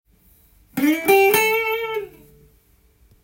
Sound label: blues, guitar; blues short phrases tab